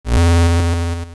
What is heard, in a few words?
Sword Clone Tile; Stepping on a cloning tile with a charged sword (edited to remove clicks, also used when a warp portal appears)